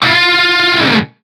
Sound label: e-guitar 348Hz